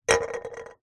foley can soda 200ml set down on concrete; zapsplat

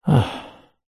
human male sigh satisfied exhale

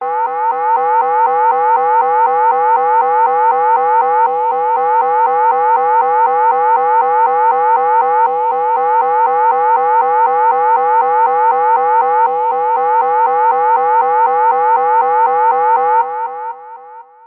science fiction alarm warning chime

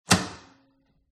close microwave

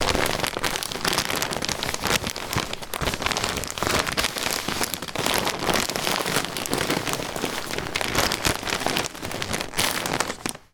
wrapping paper movement; foley; zapsplat

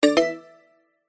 multimedia alert mallet and chime positive; zapsplat

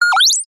science fiction robot small cute chirp

